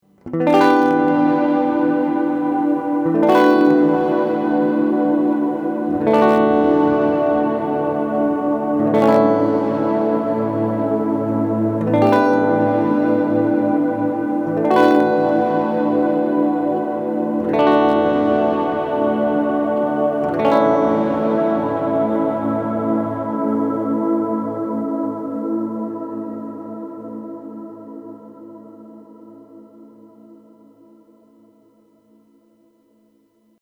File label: Stereo Reverb; Neunaber Illumine